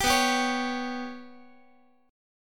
B Suspended 2nd Sharp 5th down Guitar; Bsus2#5 strummed; JGuitar

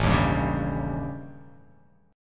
A 7th Flat 5th

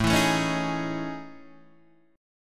A Major Sharp 11th, Guitar DADGAD

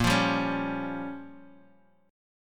G Minor 7th Flat 5th A sharp

